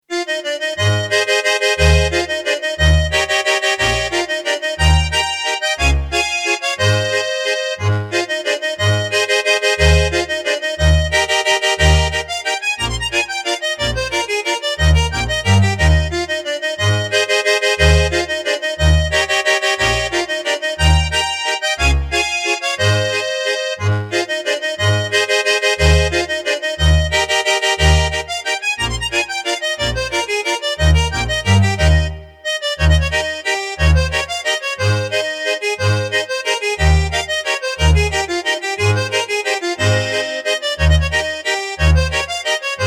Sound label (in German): Zwillings Walzer